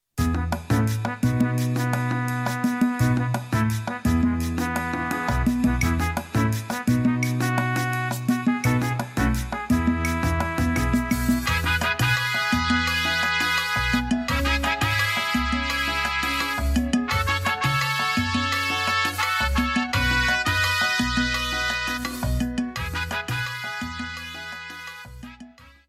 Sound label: Lat Jazz; ritmos; latino